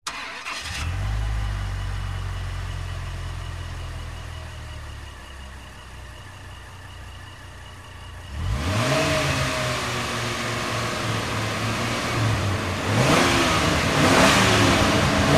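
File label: Dodge Ram Start Big Rev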